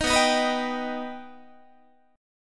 B Augmented B Guitar, chord; strummed; JGuitar

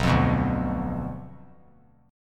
B Diminished B down; Bdim strummed

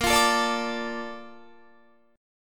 A sharp Major 7th, Guitar; strummed

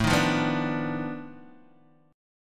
B 7th Sharp 9th Flat 5th A

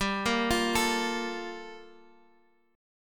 G Minor 9th Guitar Drop D

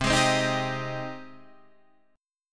C Minor Add Flat 6th C

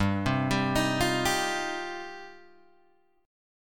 G 7th Suspended 4th Sharp 5th, Guitar Open G

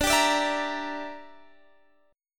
E Minor 7th Flat 5th D

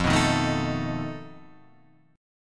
F 7th Flat 5th F down

